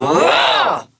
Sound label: Punch, Barks; Kuva Lich; Persona C; Ability